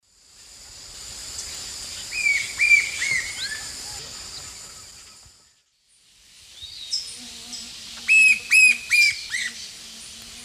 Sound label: Celeus flavus, call; S. America; Eastern Lowlands